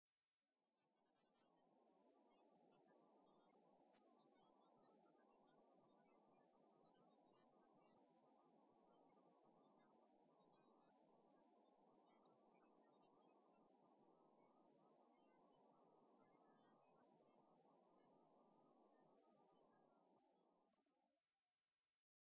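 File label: Goldcrest; koe; kikuitadaki; marunuma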